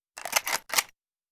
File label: handgun reload